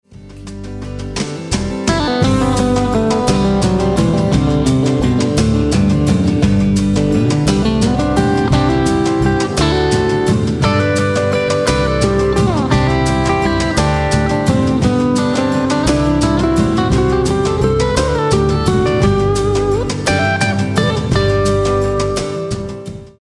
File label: Fender hi